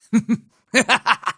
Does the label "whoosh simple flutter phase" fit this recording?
No